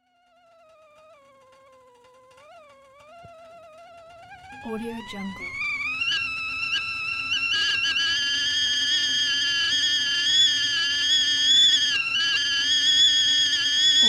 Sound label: whistling tea kettle; pure-noise